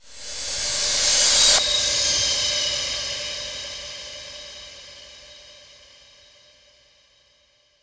Reverse Cym, Cymbals

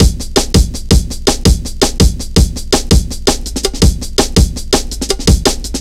165, drum loops, drum & bass

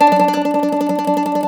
MANDOLIN; StTremolMandolin

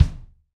Rock Kick; Roland BK-5

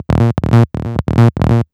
bass 138 A#, bass-licks, Techno; instrument-loops

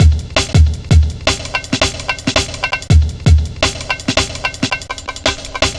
Drum Loops, 165.5, Jungle; Zero-G Jungle Warfare 1, 2 & 3

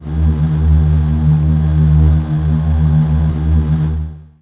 syn d#1, FAIRLIGHT; ProSamples vol.55 - Retro Sampler

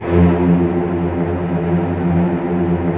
STR VCS; Roland - LA Composer vol. 4

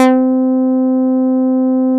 MOOG, Source Bass

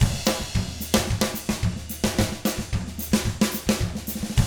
110 SAMBA, Drums; ILIO; Double Platinum Drums 1

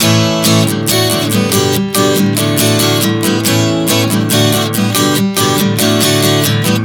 A-D 140 bpm, acoustic guitar chord progressions

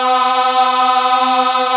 GOSP. AAAHH; Sound & Vision - Gigapack II CD 1

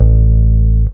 E bass (A) long note; Sonic Foundry (Sony Creative Software); Ugly Remnants VOL-2; Basstuff

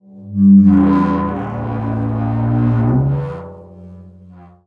Prepared Drums

